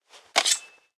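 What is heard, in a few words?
Weapon knife equip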